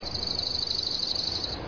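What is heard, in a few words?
slate-colored junco, birds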